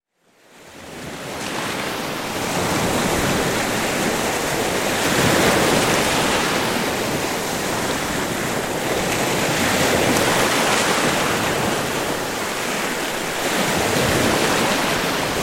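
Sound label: atmospheric sounds Bali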